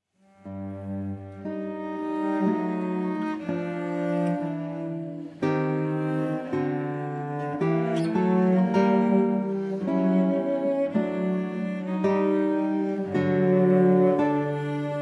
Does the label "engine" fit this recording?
No